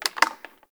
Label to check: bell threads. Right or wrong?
Wrong